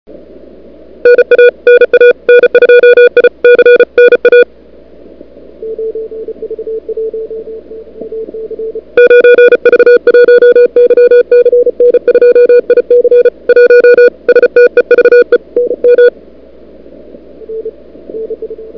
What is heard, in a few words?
Sprint Start